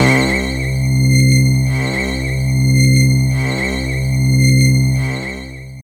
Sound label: C, Drum & Bass Pads; Total Drum&Bass; 90 s Sample CDs